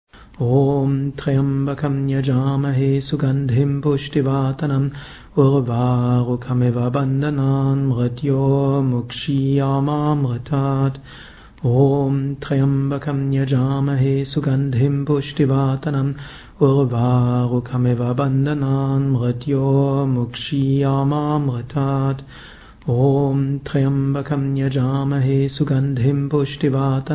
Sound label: Om Tryambakam